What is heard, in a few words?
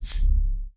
ui popup